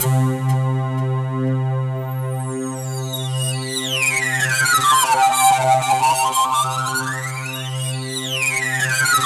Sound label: C3, SynthLine